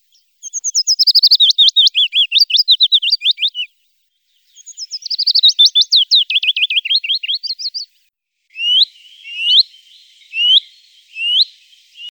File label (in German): Singvoegel Fitis